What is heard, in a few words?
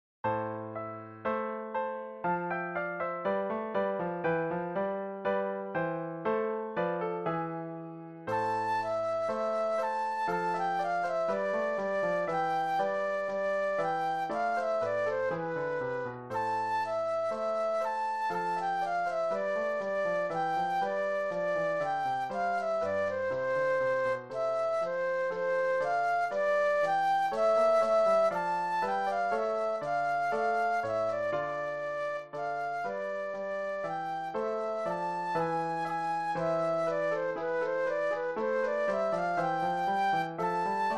Flute Solo; classical